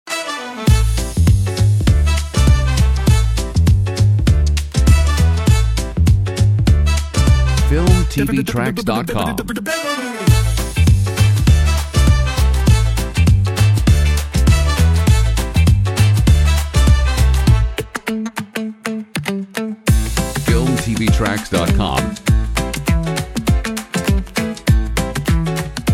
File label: BOSSA HIP HOP; comedy funny